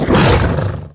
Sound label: Monster Attack Finisher; Monster Ralph Attack Finisher